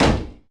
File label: NR23 Arming Off; Weapons; DCS